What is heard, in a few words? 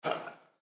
Jump; GGJ2022